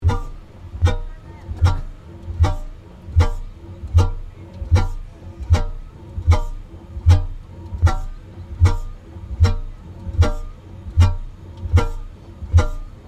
Tractor steam traction engine Foden 1910 operating smoke box